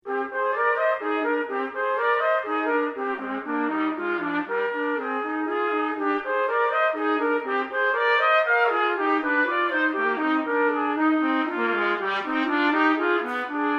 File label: duet, trumpet; Vizzutti